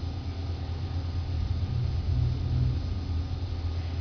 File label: pipe sound